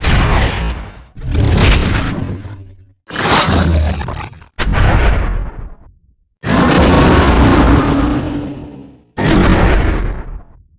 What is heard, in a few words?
Attack; Mars Sortie (Arcade) (gamerip) (2018); N06 Attack